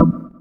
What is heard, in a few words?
org jazz, Stabs; House; Oneshots